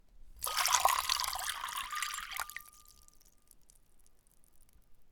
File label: Water Pouring Full ceramic bowl